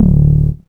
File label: BASS